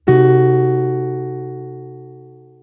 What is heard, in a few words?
6 cdim7